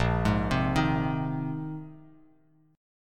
Bb 7th Suspended 2nd Suspended 4th Bb, Bass Standard; Bb7sus2sus4; arpeggiated; 6,11,10,8; JGuitar